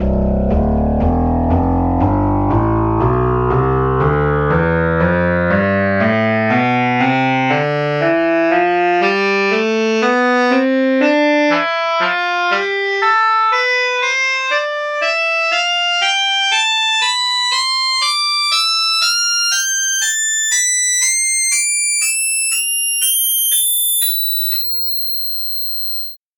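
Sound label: tenor saxophone tonleiter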